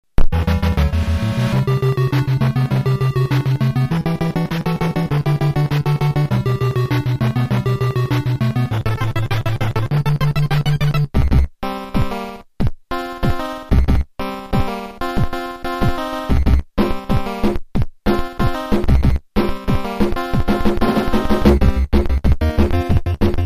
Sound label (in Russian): tema 8 bit; battletoads